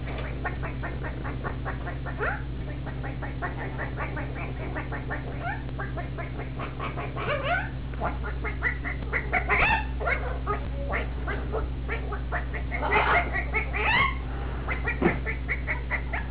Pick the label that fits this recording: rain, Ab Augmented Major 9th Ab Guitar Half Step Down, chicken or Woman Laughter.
chicken